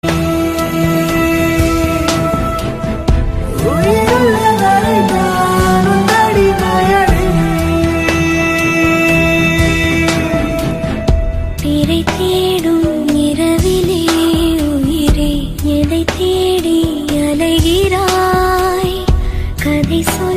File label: tamil romance